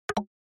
Percussive Accent Low

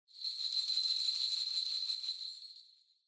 Ring Bells Dry Long

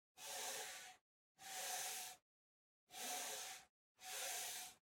PC-Mouse-Move (Far-Soft Pad-Long)

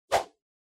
Motion Swish; モーション スイング

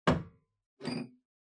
Pendulum Clock Hardware Close

